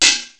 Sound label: Hat Closed, Korg T3